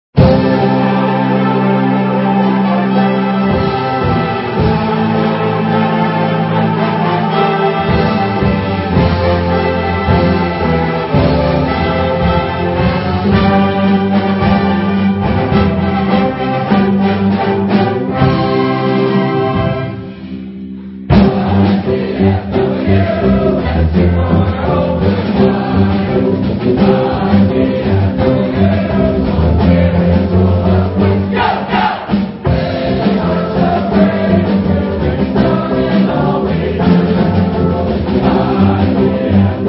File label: Pep Band Fight Song (singing); IPFW